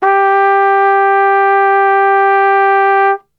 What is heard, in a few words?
G 2, Brass; Best Service; Brass Super Section